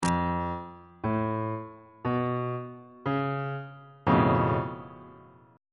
d flat augmented 7th 1st inversion on bass clef

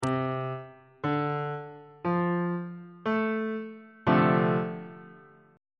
e sharp major 6th 2nd inversion on bass clef

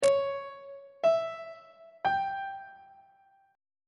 d flat diminished triad chord on treble clef

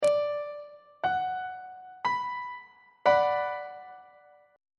b minor 1st inversion on treble clef

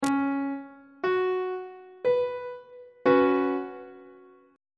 c flat suspended 2nd 1st inversion on treble clef

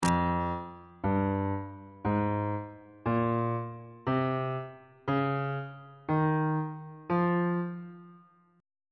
e sharp aeolian mode note positions on bass clef

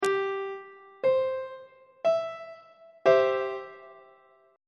d double flat major 2nd inversion on treble clef

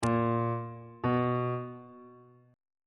a sharp perf1 aug1 intervals

